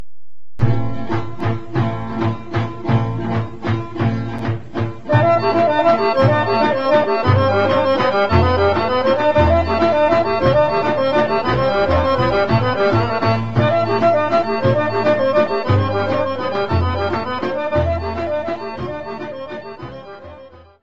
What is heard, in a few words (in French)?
Oro - Bulgarie